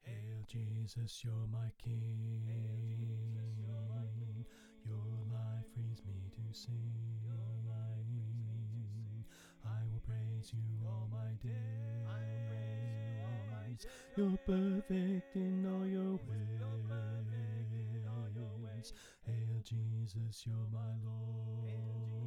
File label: Victory Chant Bass Left